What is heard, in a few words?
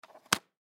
Flute Case Close; Flute Case Close Hard; Woodwind; Blastwave